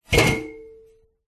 household stool chair set down tiled floor

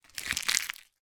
packet small screw up; foley; zapsplat

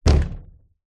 impact wood fist hit hollow wall loose

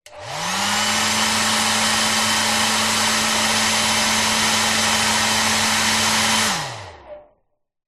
household hairdryer on run off no movement short; zapsplat

